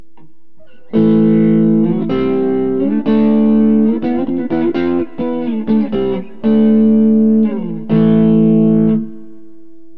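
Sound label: ant neck, guitar pickup; burny; fugly clips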